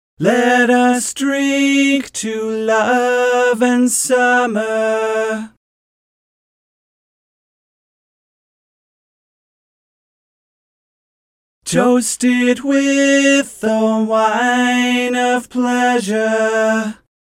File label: Lo Harmony